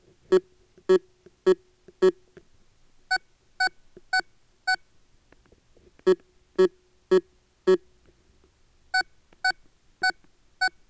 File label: Rapier 2 sound